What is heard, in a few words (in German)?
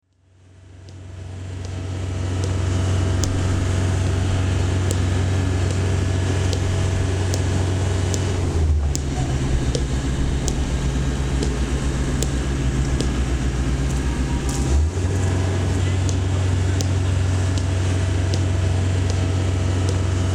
Haemmern; soundscapes